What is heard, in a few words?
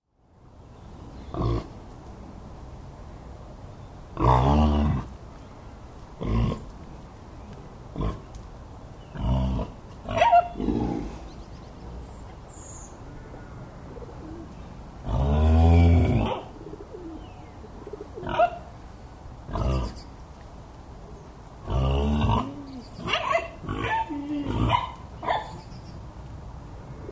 grunting pigs; mudchute